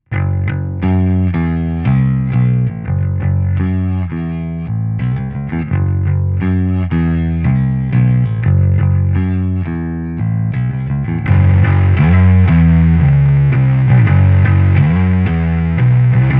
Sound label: Crush 100 Bass Drive Switch